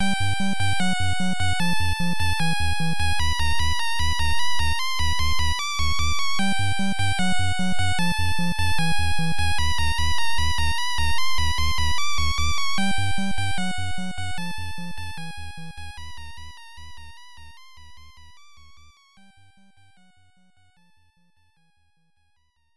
Room Guard Combat BGM; Dragon Buster; NES

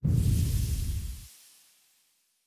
shaman spell

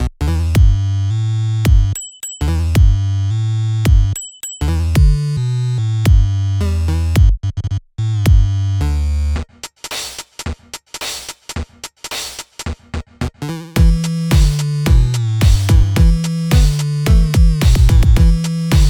Instrumental; Cement

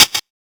Click

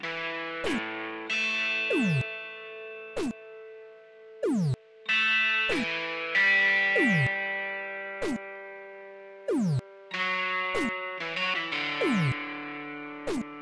Combat Guitar; Draw a Stickman Epic 2

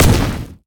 Fire Tile

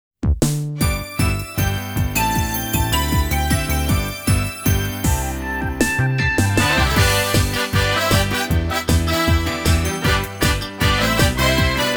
Instrumental Ver.; Radiata Stories Original Soundtrack

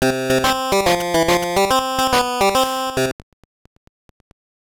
Jingle; Dungeon Land; GB

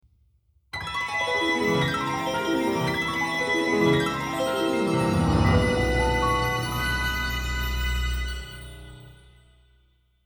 Organ of Evening Calm; the legend of zelda links awakening orchestral arrangement 2015; 7th Instrument of the Sirens

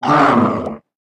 Fishmen Death; we are the dwarves gamerip 2016

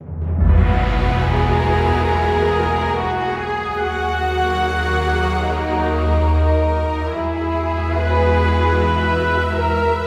Dramatic; Elven Assassin; Rotp Dramatic 1a

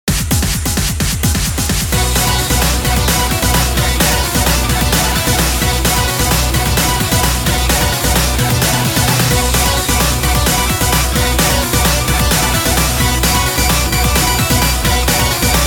Escape (130bpm)

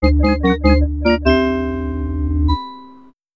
Star Catch Fanfare; B3313; n64

